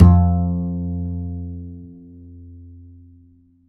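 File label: double bass F2, Jazzistic; Univers Sons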